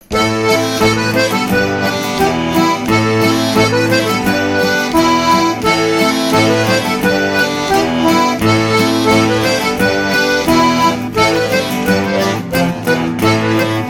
Branle de Bourgogne

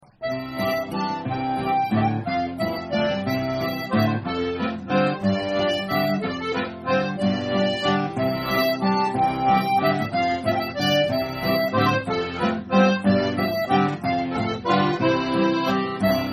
Valse Limousine

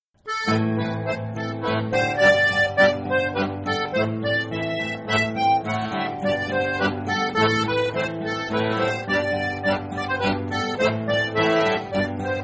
Branle descosse

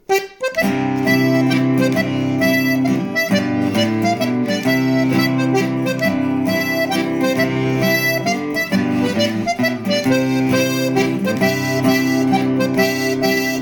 Mazurka; En montent la Costa vieilha

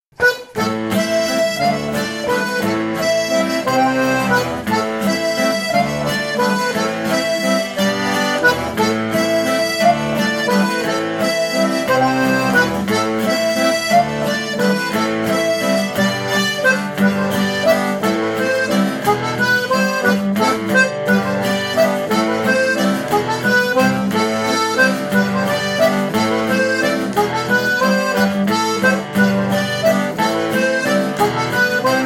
bourree; de Roger Blanchard

